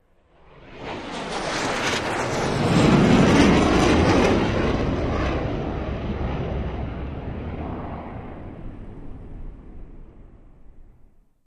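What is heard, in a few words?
F16 Pass By Medium Fast; f-16 fighting falcon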